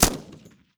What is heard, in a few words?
helicopter m249 single; Rust